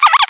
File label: All Warning